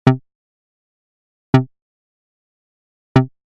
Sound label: ADSR